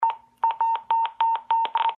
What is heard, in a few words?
alarm activate; norden-sounds